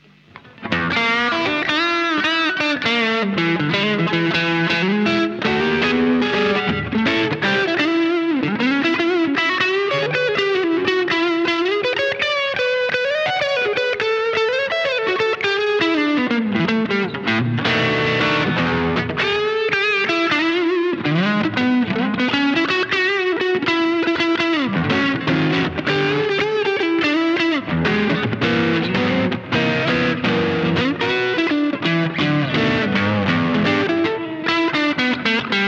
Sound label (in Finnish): clean tele kaula